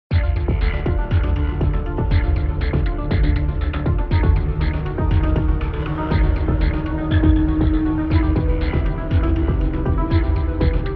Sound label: Warnings and Alarms; deliver us the moon 2019